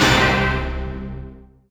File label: D2 STAB; Zero G; Man Machine